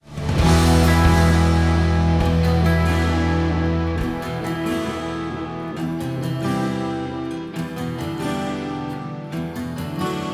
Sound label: rock loop; heroes of the storm full gamerip 2015; starcraft terran1 part3